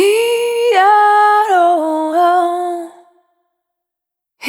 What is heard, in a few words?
Spin, Single Voice Melodic Loops